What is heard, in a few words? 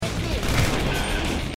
headshot